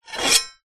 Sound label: warfare sword medieval heavy draw scabbard